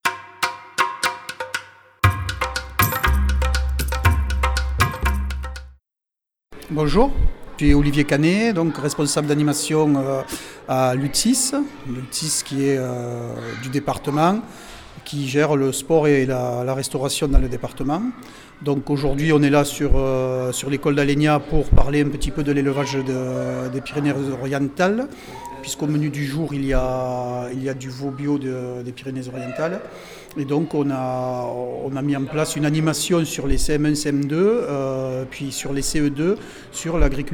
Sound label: jingle; Occitanie; Pyrenees-Orientales